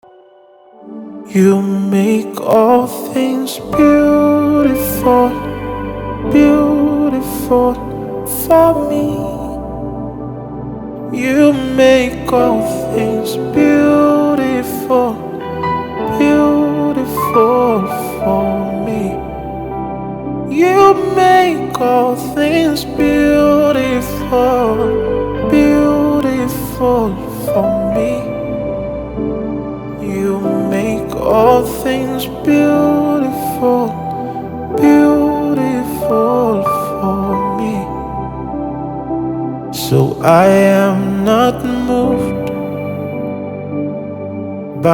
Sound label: Piano Session